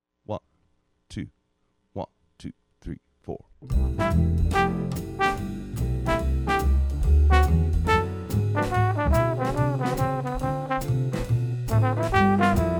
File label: swing trombone